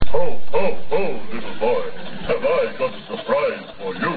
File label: hoho